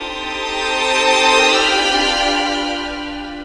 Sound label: Orchestra Mood; Zero-G Jungle Warfare 1, 2 & 3; Strings & Things